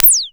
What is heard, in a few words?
ZAPPY; Back In Time Records; Big Bang